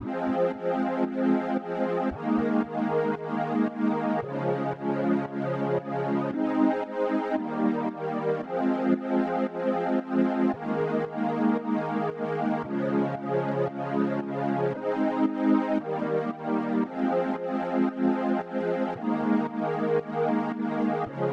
114 BPM F Min Chords; Retro Collection

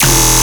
Bass Hit E, Dubstep

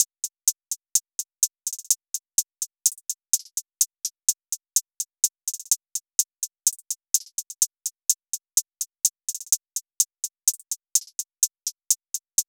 Hihat Loop 126 BPM; Plug; Hip Hop

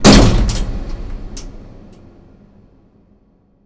impact hatch; Hits, Impacts

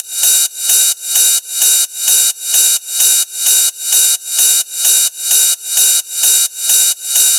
Ride Loop 130, Techno; Techno Sounds 2024; Rides

